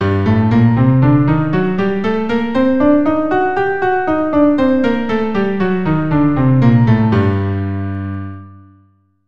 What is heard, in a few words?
Gm Harmonic 100